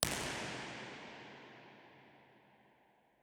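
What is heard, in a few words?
sports centre ORTF, impulse response; university york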